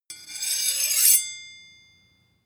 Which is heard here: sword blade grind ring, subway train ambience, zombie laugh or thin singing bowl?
sword blade grind ring